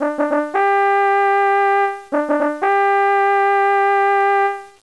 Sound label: kaval